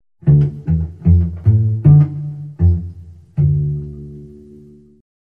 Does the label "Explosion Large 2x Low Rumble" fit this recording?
No